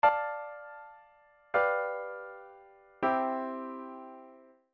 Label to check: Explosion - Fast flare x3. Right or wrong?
Wrong